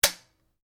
RIFLE CZ 452 VARMINT 22 CALIBRE DRY FIRE; A selection of domesttic firearms; Rifles; Guns

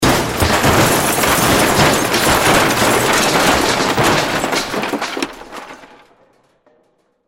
Crash Roll Metal Glass Debris Large Object; Crashes; Blastwave

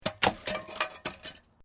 Metal Light Movement Minor; Materials

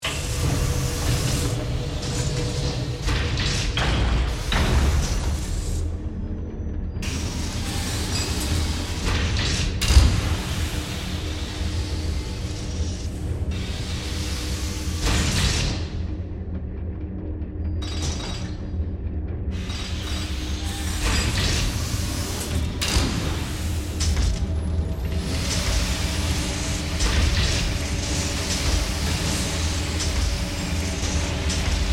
Factory Machines, Science Fiction; Blast Wave

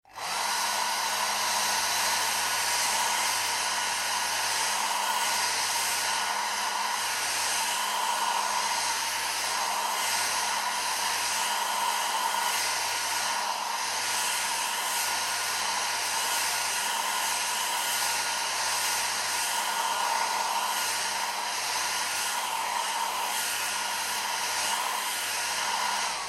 household hair dryer on operate off; Hair Dryer On, Operates Then Off; Bathroom (Sound Effects:Household); McKinney Sound

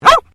Animal Dog Border Collie Female Bark

